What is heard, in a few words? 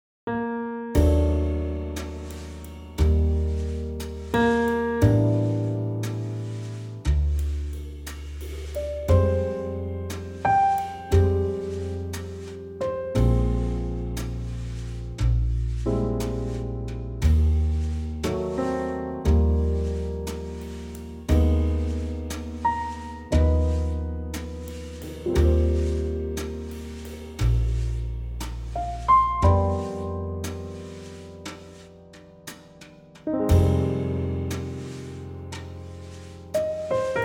ballad Eb